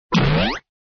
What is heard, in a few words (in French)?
Coussin; mecha cat destructo